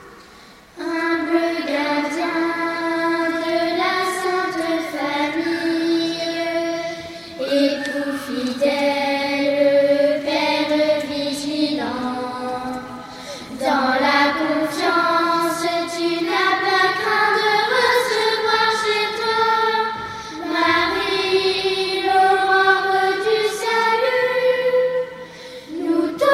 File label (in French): chant st joseph